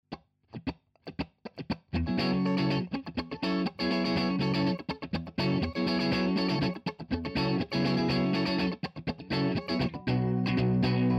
Kytara clean Funky Voodo VR 2 Active

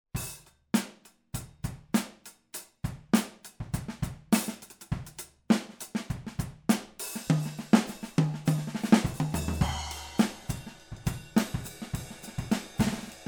Bubny OH; Recenze SE 5

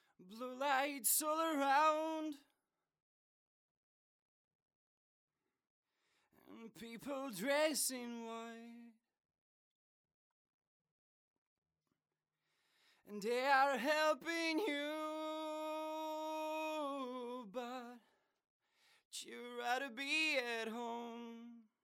Vokál no comp Zpev

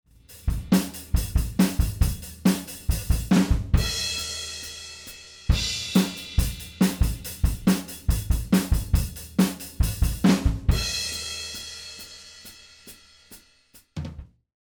Bubny no HP; high pass filter

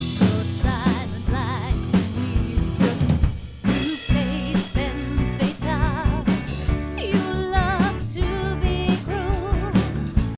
Vokál hudba no comp

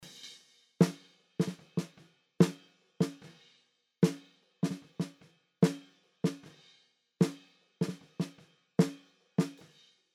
Virbl no comp Snare

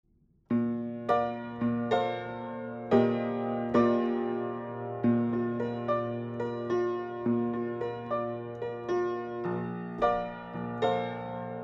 Pianino AKG 440

